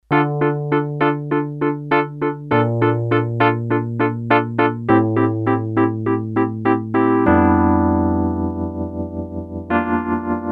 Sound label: El. Piano, Korg DS-8; Factory Patches